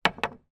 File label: pool snooker cue down on table; sport